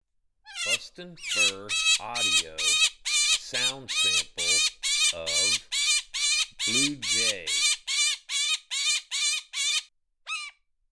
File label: Blue Jay